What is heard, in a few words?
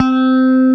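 phaser C5, chorus guitar; East West - Global Sounds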